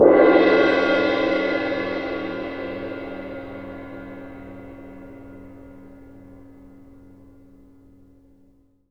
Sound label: CYM P, Percussion; ILIO; Synclavier Percussion 2; World & Orchestral